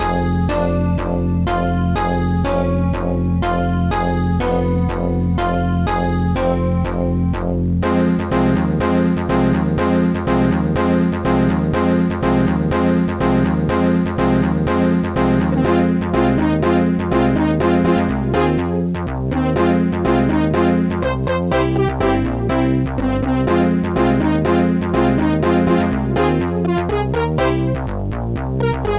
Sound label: melodious; SoundFX 1.3